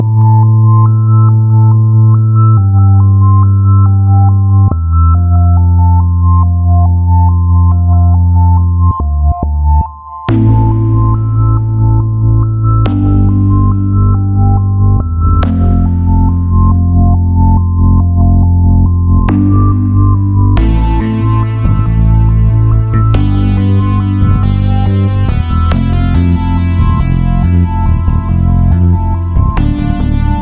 Sinister Tone, No Percussion

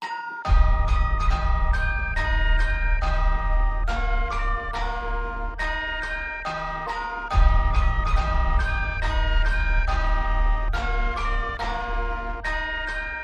Wheels On The Bus Horror, Inst

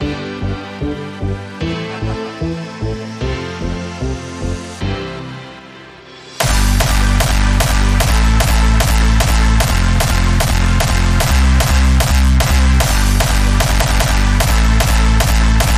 Hardstyle Festival Rhythm

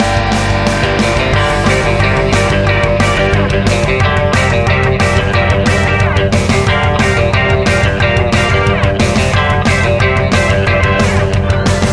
Electric Guitar Frenzy

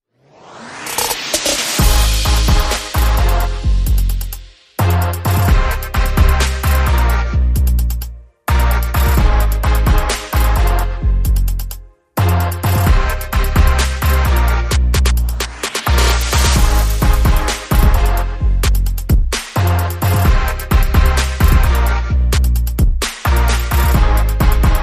No Synth Lead

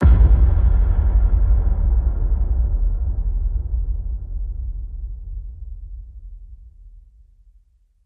Hit Low Basalt Bath

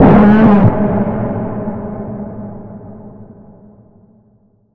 Bend, G sharp

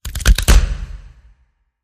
Bones Breaking Hit

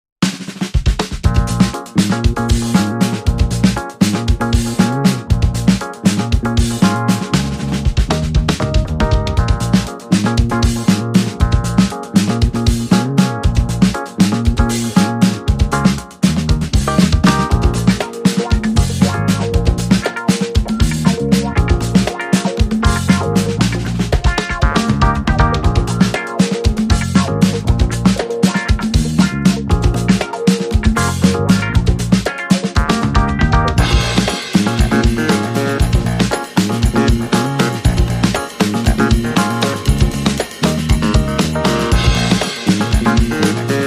Afro Beat Fever

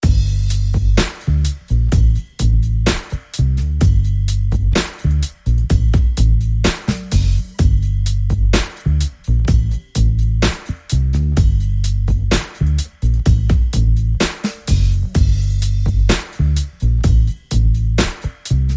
Drum and Bass

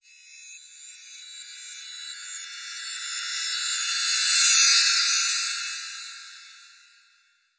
Wonderland Chimes Reverse

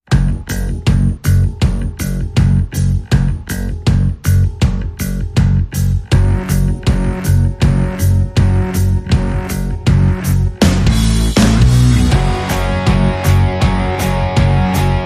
No Horns

